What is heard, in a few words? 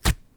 Paper Rip